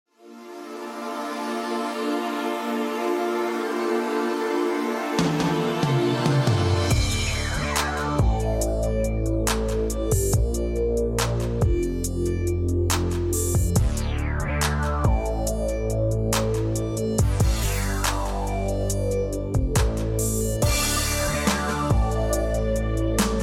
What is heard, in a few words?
deep bass